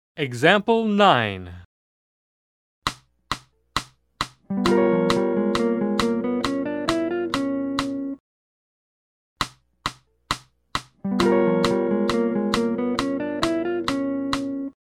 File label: Jazz